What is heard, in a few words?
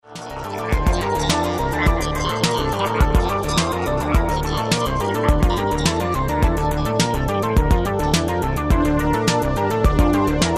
jam; The Hague